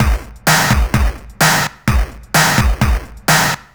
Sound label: Drums, 128bpm, Nu Dance Electro; Zero-G; Soundclash; Acidized Wavs; Combi Loops